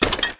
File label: put weapon